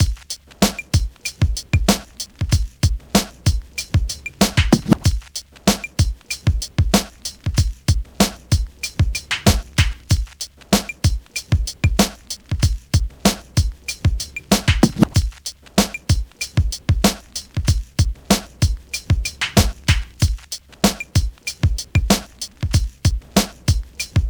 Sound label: BEAT 95, Beats 93-96bpm; PlatinumPhattCD1; E-MU Producer Series Vol. 8 – Platinum Phatt